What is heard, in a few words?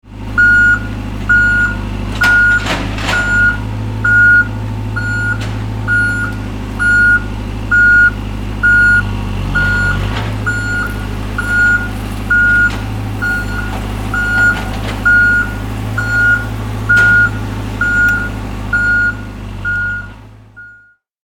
Bulldozer movement backup beeper